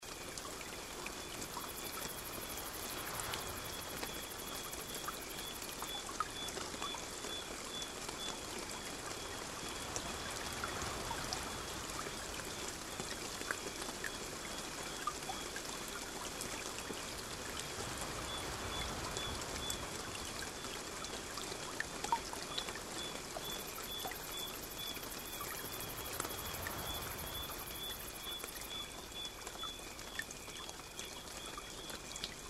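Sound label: tropical night, nature sounds; ZVUKI PRIRODI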